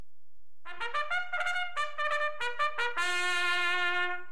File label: Military Calls